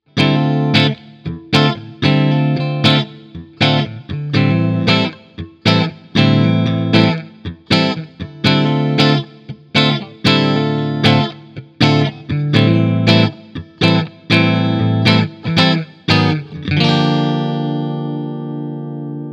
Scuffham Amps S Gear Custom 57 Clean 4x12 Vintage Basketweave